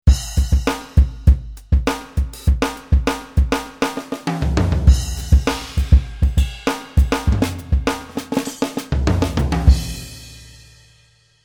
Jz Funk KIT; xp-80; roland; 70JzFunk KIT patch demo; roland demo cd-3

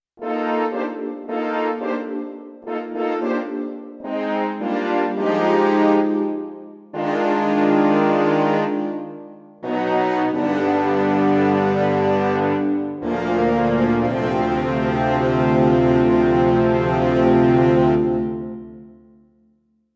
St. Orch Brass; xp-80; roland; St. OrchBrass patch demo; roland demo cd-3

